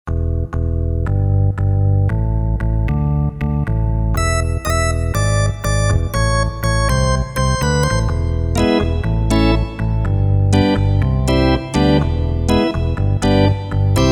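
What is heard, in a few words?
Drawbar Organ, Casio CTK-601; Tones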